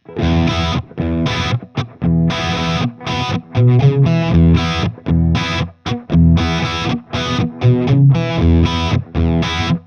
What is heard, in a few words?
Chunk